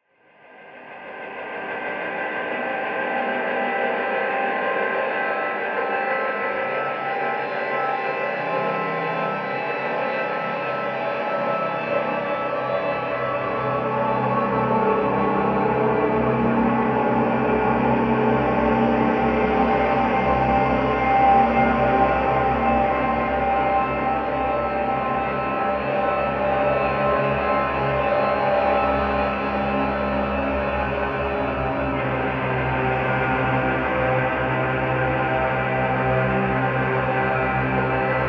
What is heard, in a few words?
haunted house